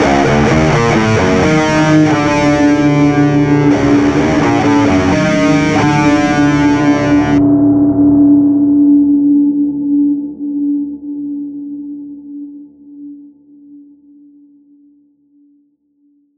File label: Rock Guitar; Zystrix